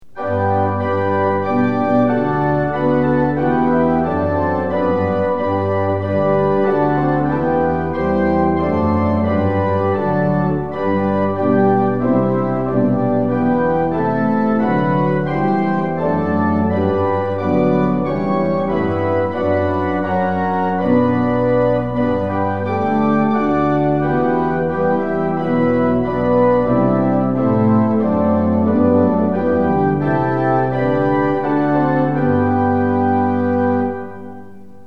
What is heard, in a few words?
tune; hymns; lillenas